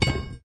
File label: Drop Shovel